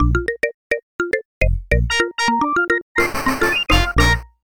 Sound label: Level Start; Ariel the Little Mermaid; Genesis